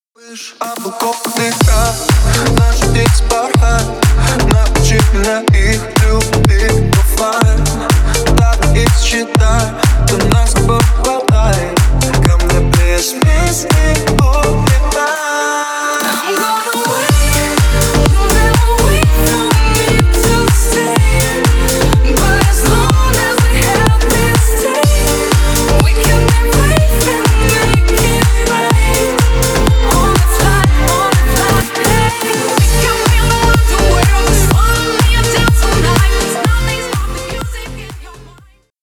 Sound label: remix; russian